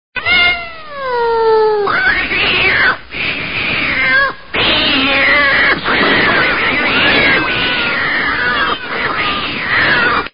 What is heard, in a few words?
Cat Fight